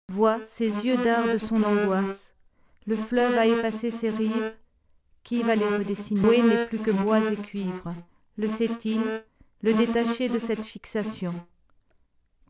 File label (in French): voix flute